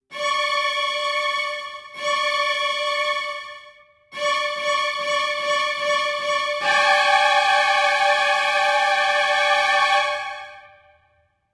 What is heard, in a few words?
strings; Undead Overlord